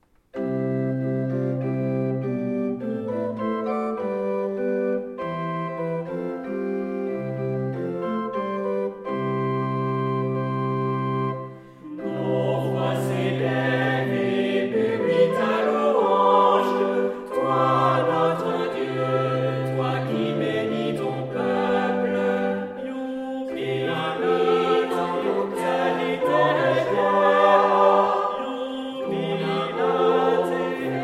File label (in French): Hymne polyphonie; Jubile 2017; 700 ans diocese lucon